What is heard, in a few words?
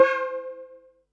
Perc, Percussion and FX; Pearl SC-40